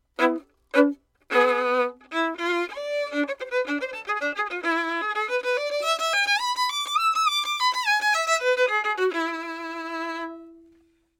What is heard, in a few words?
violin; lewitt